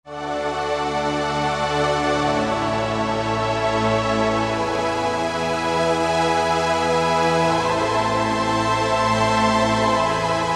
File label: VP-330 Tutti, vintage synth; Roland SR-JV80-04